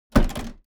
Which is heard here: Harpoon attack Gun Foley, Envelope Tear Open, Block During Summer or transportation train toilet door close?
transportation train toilet door close